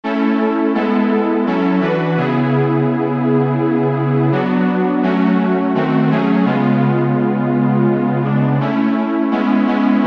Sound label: Lullaby